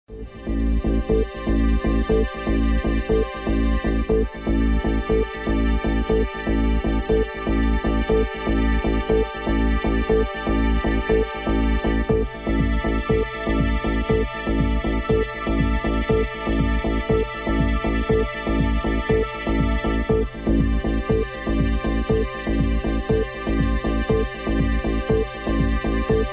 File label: Closing Theme; BBC World News Impact